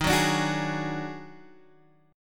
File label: Eb Minor Major 7th Double Flat 5th, Guitar, Half Step Down